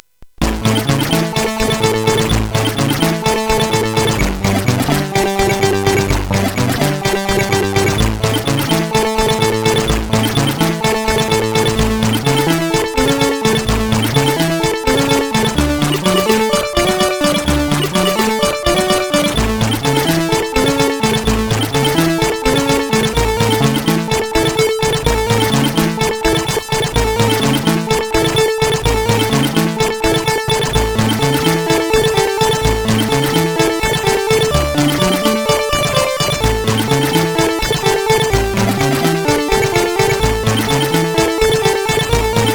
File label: Hi Freak, CSG8580R5; hvsc